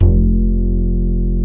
acoustic bass

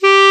KLARINETT, Alpine Volksmusik; Best Service

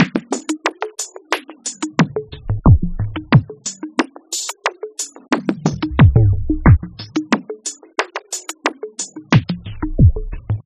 machine 090bpm; Zero-G; Downbeat Dance Grooves

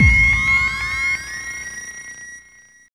END, Tekno Industrial; East West

